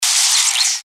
future electric arcing and swoshes

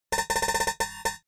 typewriter sequence

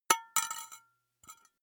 glass metal small drop

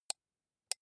computer mouse right click slow soft

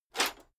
metal plate armor movement single

